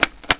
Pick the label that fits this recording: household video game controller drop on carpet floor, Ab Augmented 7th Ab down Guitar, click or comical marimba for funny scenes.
click